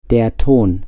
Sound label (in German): Ton